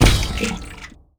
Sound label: water shot